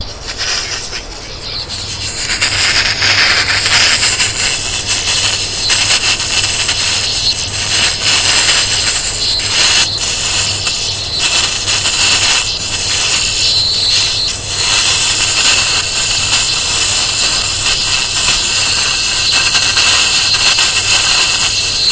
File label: electrical discharge behind a closed door